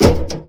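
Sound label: ladder metal, player; quakemapping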